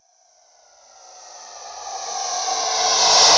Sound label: Reverse Fx; musicradar